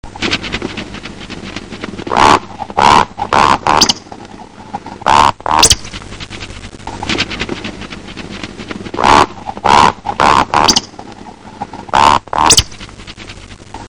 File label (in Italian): Beccaccia